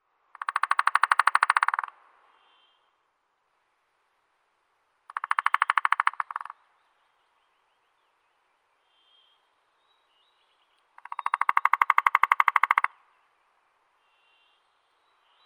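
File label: three-toed woodpecker, Picoides tridactylus; dyatel trehpalii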